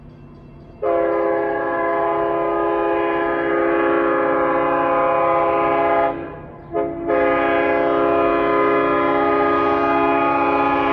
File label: tearing through, K5LL, horn; Glen Head June 2019; lirrhorns